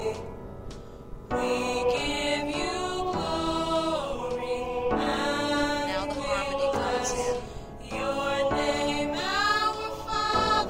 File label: Soprano